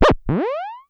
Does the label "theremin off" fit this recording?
Yes